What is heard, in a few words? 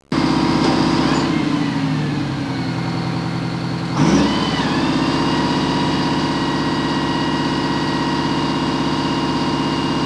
pumping, fire; disaster